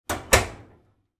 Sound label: household oven door close